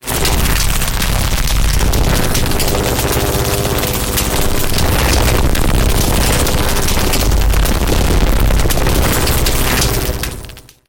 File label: electric long, substation; mechanical units; Half Life Alyx